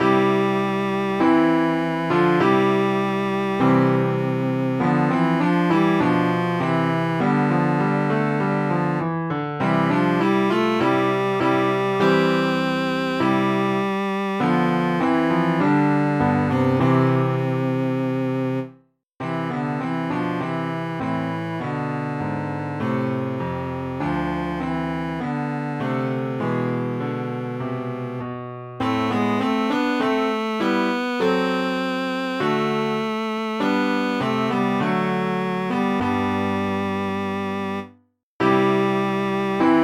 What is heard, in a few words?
chorus, cello; suzuki cello school